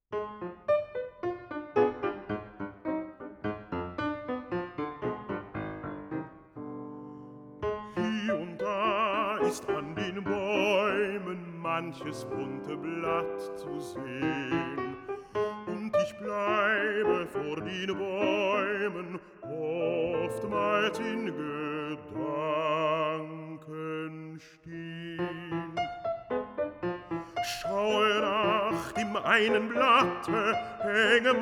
song cycle for voice & piano; Book II, Letzte Hoffnung; Schubert; Winterreise

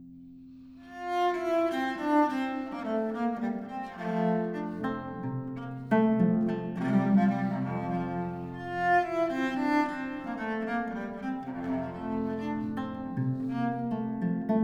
Saraband in F major; Les Voix Humaines; Abel, Bach, Marais, Sainte-Colombe

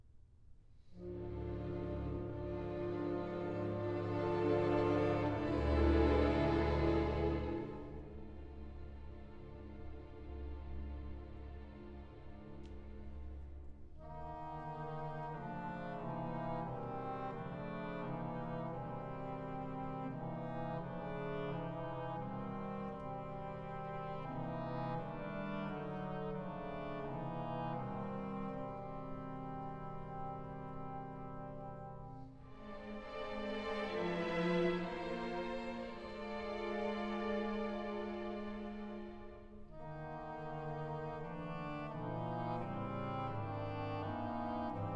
Etudes for orchestra, Cantique; Stravinsky; Four Studies

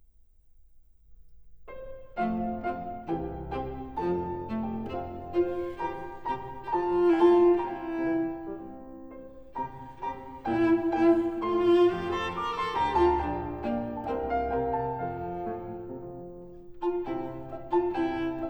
Variations for cello & piano in F major

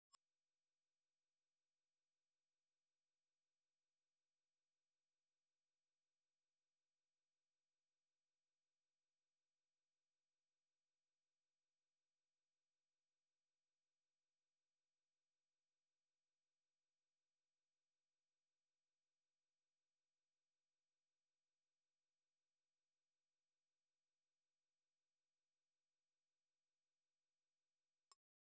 19999hz Sine Wave 0db R; Denon Audio Technical CD

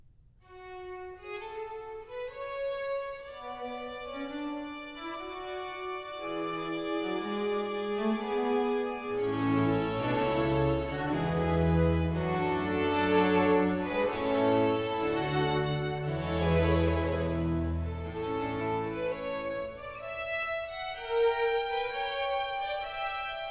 Concerto for strings & continuo in G minor, Largo; Vivaldi

